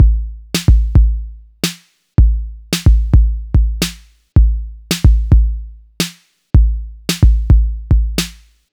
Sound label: DrumBeat